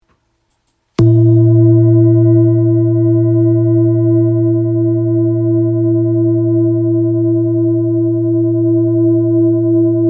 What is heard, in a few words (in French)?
Bol chantant thérapeute 1.325kg la dièse